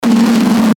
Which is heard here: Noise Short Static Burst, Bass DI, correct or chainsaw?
Noise Short Static Burst